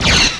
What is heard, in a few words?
Pulse Phaser